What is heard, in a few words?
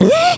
SCREAM